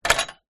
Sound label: household bolt metal gate movement